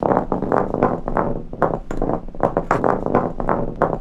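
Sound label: Roll Loop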